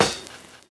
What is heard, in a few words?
VINYL SD, Drums Dance & Groove; USB Soundscan vol.11; AKAI; VINYLKIT